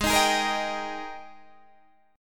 Ab Major 7th Suspended 2nd Ab down Guitar Standard; strummed; JGuitar